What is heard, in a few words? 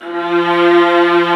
Vla F2; Digital Sound Factory Virtuoso